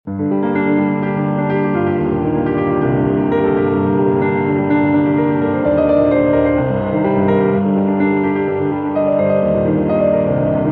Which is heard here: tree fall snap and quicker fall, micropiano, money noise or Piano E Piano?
micropiano